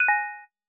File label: detach